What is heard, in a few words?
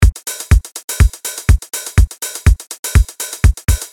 Modern Chicago House